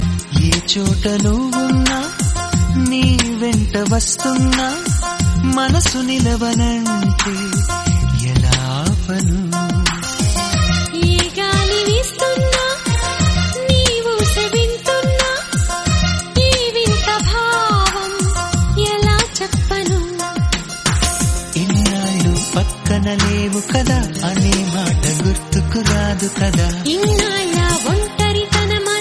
melody song

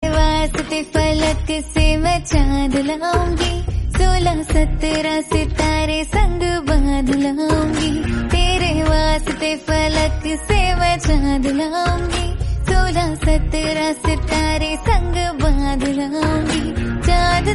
female version hindi song

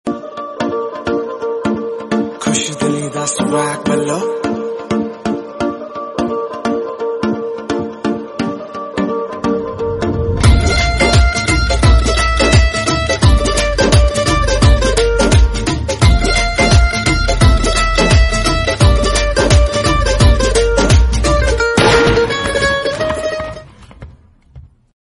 Instrumental

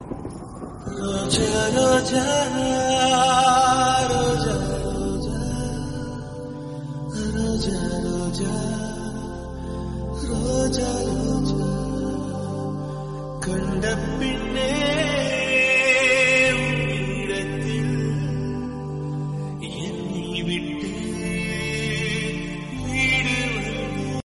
song, telugu; roja

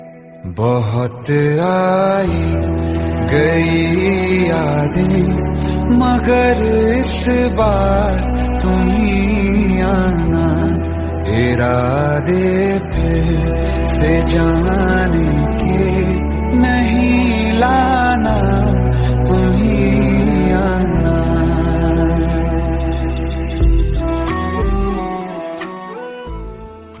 romantic bollywood hindi; marjaavaan

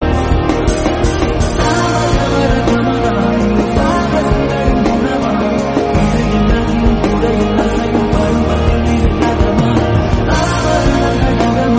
male version tamil song; vaikundapuram

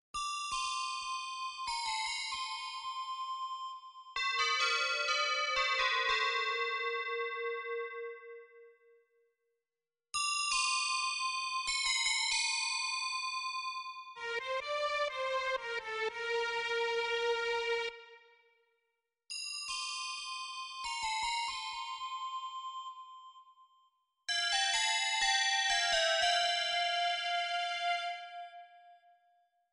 meditation quena

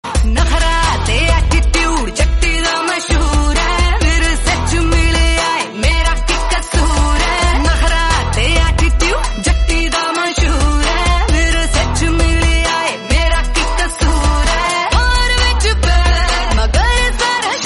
rap

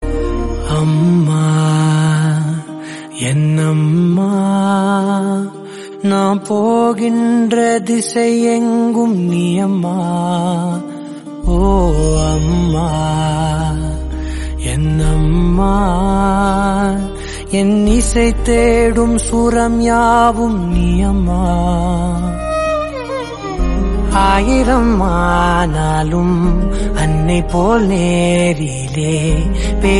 tamil song

